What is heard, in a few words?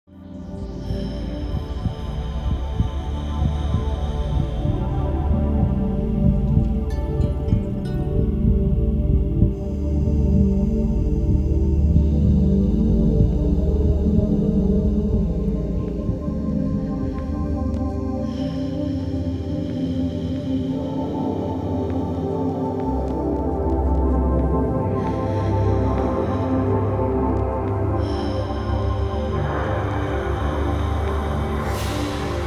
Haunting Music